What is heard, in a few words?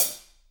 HH, Roland, HH REAL